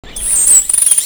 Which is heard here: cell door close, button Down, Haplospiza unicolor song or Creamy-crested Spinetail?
Haplospiza unicolor song